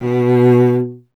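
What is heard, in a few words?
strings cello B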